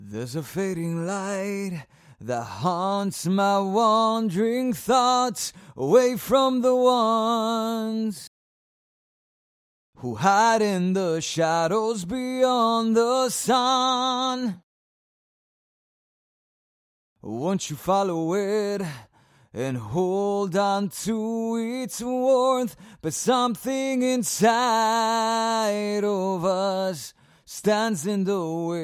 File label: rock 83 CM